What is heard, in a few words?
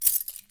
Keys